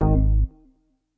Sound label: chip fail